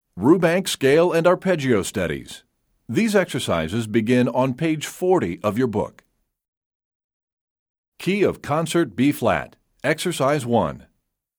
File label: Concert Bflat, Percussion; EE2000 Book1